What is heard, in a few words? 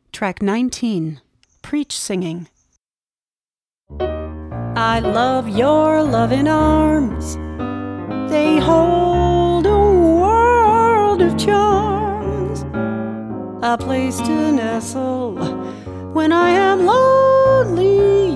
Swing; Preach Singing